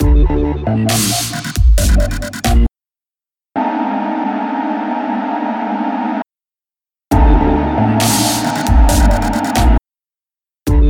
Sound Interference

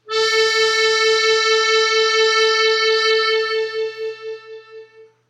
Accordion A wet

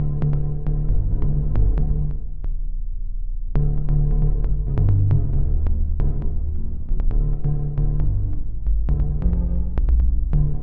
bass tri wave

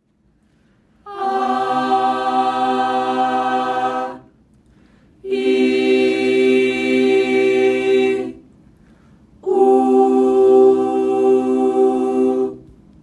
Group singing C Chord "ah" "ee" "oo"

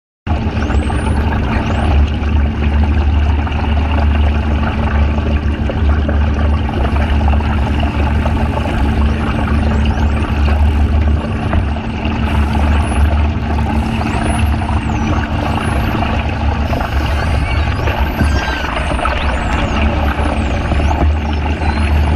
Bottlenose dolphin whistles

